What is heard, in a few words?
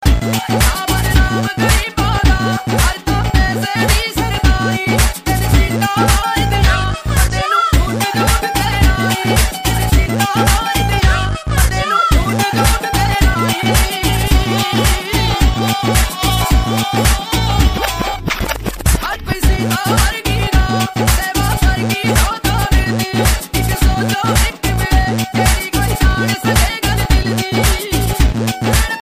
remix punjabi ringtone